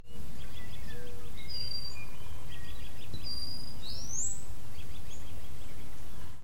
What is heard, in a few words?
Brown headed Cowbird